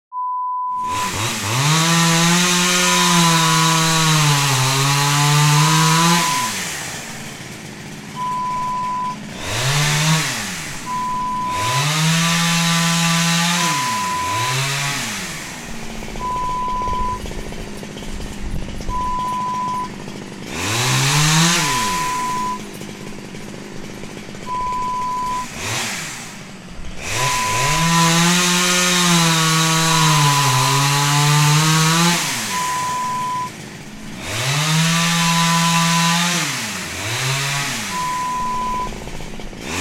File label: Chainsaw